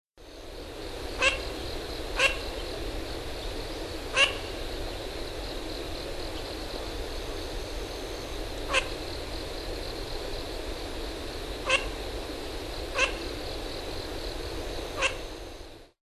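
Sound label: kogamo, Eurasian Teal; コガモ; 兵庫県南部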